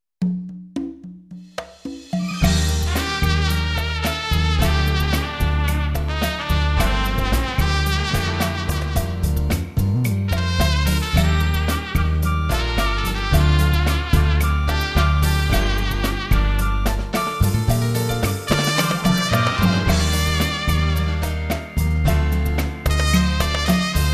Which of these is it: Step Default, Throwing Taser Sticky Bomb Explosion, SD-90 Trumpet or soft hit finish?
SD-90 Trumpet